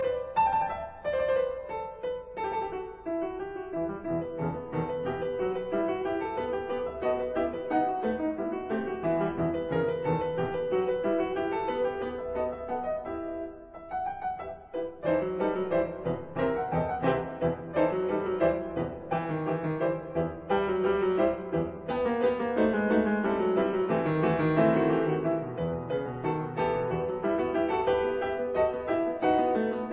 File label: Tambourin in E minor; No 6; Rameau